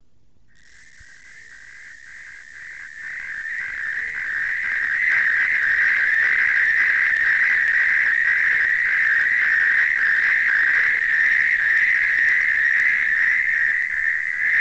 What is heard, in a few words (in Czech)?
ropucha obecna; obojzivelnici